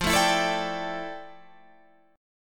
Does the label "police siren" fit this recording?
No